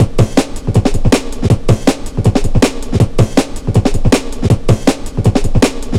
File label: 160, drum loops, drum & bass; Total Drum&Bass